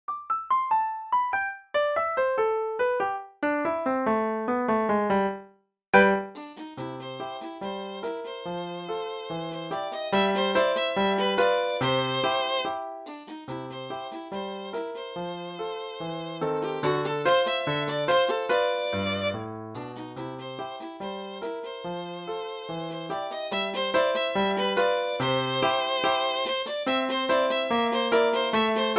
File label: the entertainer viola